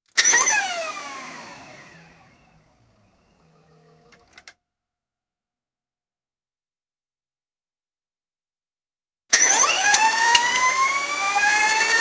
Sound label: ignition takes a little, alternator; SFX Engine